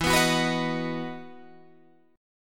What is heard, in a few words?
E Major 7th Suspended 2nd, Guitar, Open G